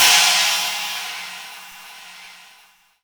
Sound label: big crash cymbal sample G key, Royality free crash one shot tuned to the G note. Loudest frequency: 4664Hz